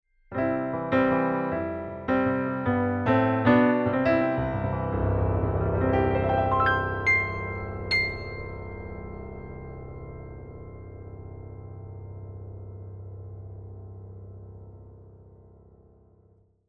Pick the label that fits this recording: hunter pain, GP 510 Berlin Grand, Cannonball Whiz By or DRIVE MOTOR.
GP 510 Berlin Grand